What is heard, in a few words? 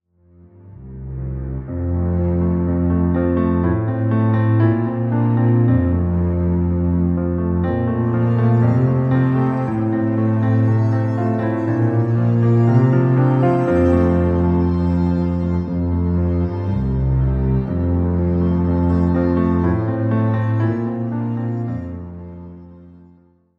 Background Instrumental; earberries